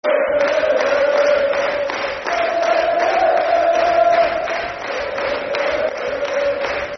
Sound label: Football Chant (Soccer Songs); Scunthorpe United Scfc